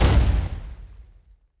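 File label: Owl Bear death, monster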